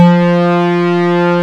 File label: MOOG LEAD; Kid Nepro; Elite